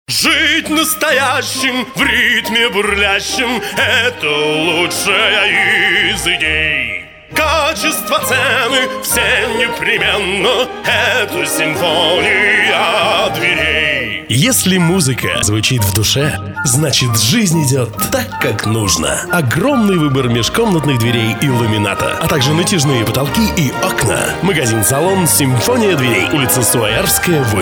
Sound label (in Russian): Музыкальный Симф. песня